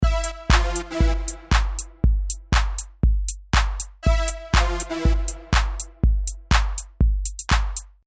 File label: Lead; Ultra Analog Session; Lead Master